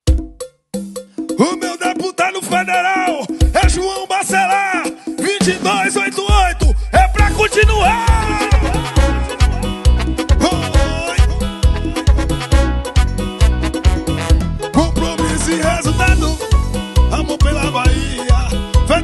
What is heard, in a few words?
jingle